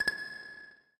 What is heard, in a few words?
miss